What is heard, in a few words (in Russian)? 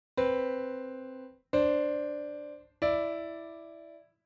septimy